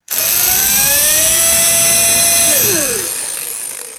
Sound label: pneumatic air wrench running